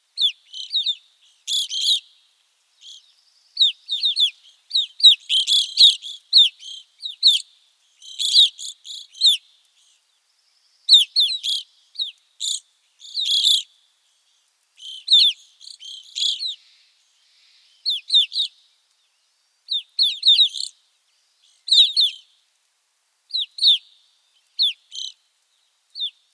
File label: Evening Grosbeak